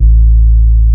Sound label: BASS A 1 SMTH, SYNTHBASS